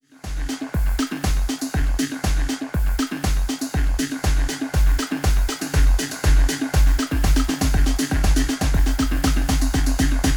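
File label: Reloop RMX 90 DVS Delay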